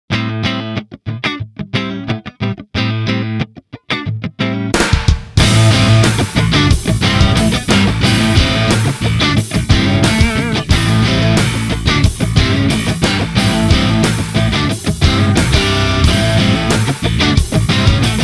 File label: Grunge